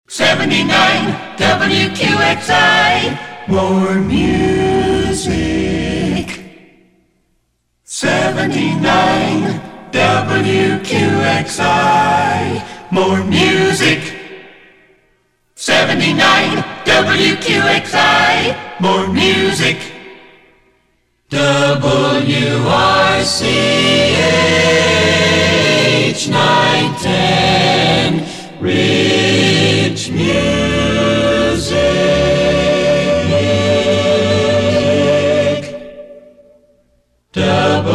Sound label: Acapella Demo; WQXI-WRCH